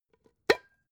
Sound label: pot lid open; objekte; topf